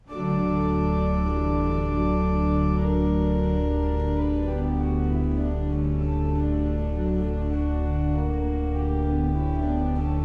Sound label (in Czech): preludium d dur; dvorak